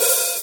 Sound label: open, virtual drum sounds